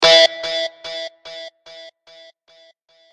Guitar E; Pokemon Reborn E19

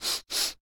Zombie Idle

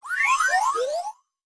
Dizzy

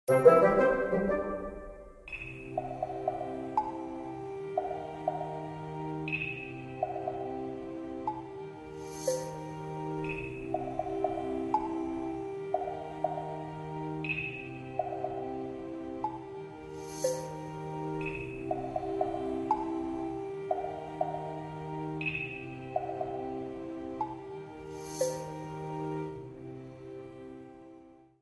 Loading Screen; asterix obelix xxl3

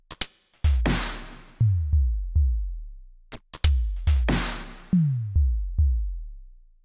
Snare

